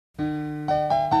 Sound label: INSTRUMENTAL